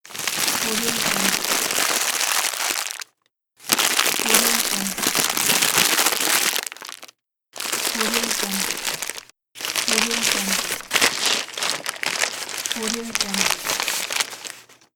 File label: paper bag